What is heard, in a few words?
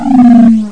Scuba Pigeon